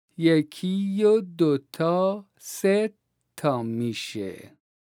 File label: vocalization, Daf